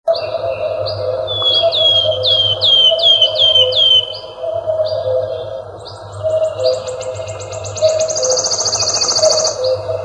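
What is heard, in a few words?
wood warbler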